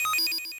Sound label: Activate Powerup